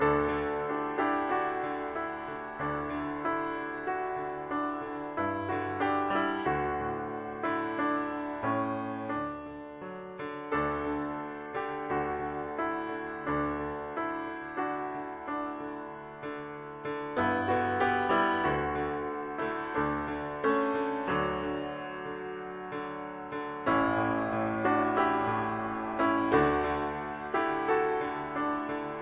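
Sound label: deck the halls instrumental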